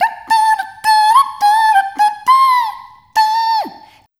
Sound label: Rhythmic A